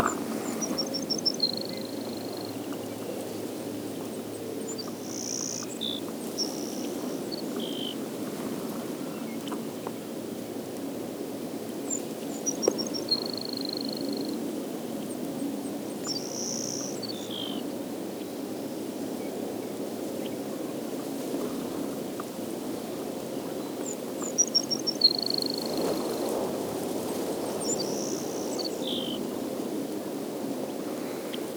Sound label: Ammodramus bairdii